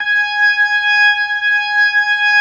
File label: G#5S, B3, Vintage Keyboards